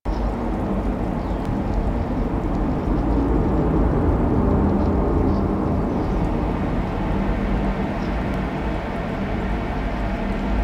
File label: Diorama Landscape Loop; Duviri; Terra